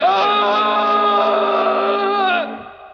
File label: Scream